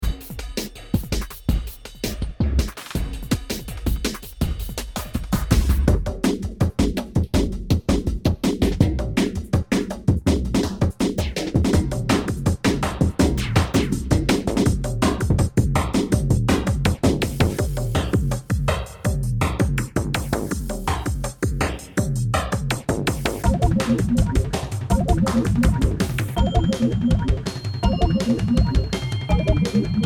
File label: DnB